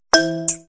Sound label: xiaomi black shark 5 pro notification ringtone